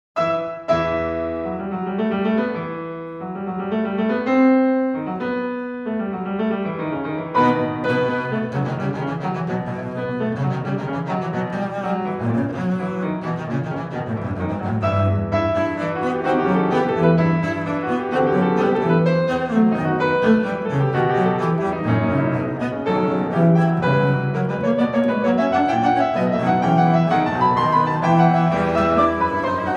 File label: Sonata for Cello and Piano in E Minor Allegro